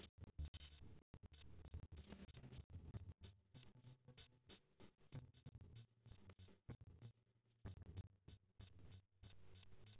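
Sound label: with drums; SiSEC11; underdetermined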